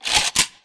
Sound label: m200 reload, guns